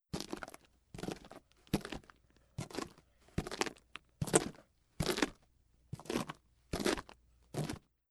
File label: Footfalls Dirty Floor; footsteps